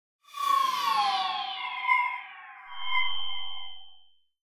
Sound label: Descend Feedback Front To Rear; Production Element Imaging Element Accent Transition